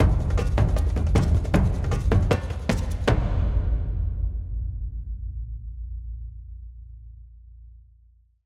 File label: Pub Brawl Upstairs Perc end tag